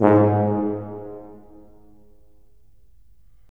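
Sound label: brass tuba; LA Composer vol. 3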